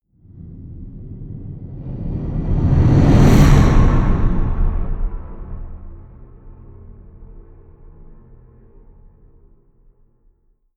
whoosh race

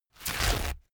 paper towel roll sheet tear up

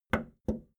video tape player plastic play click slow

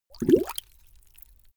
bubble short

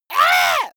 monster painful cry high

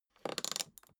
suitcase open latch lock slow

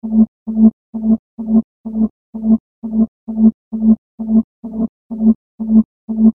pulse

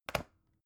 folder close soft

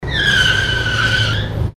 car braking

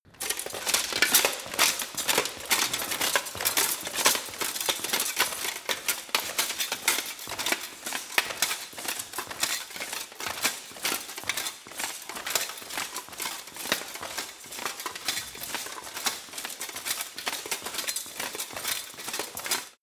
walk fast

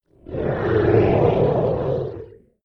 roar calm

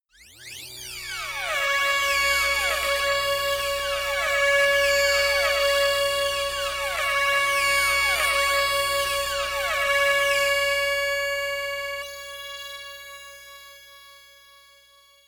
glitch scanner

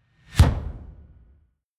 incoming kick drum

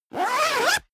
zipper down jacket close fast

